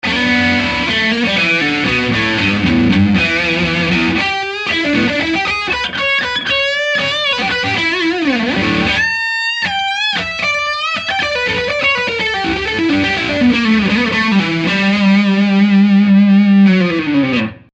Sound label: brett middle; schwarzbach